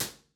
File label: ir bathroom